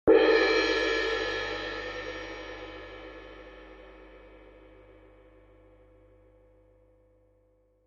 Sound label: K Constantinople Suspended, cymbal